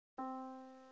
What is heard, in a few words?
Yamaha Disk, piano sound